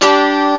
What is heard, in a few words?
gtr. e